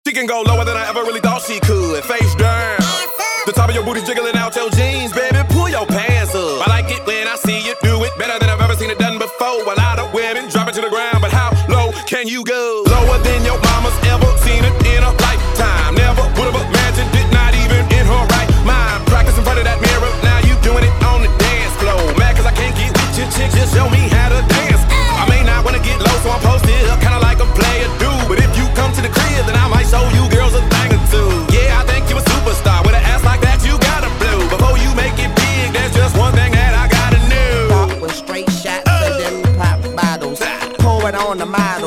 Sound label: Classic Hip Hop MashUp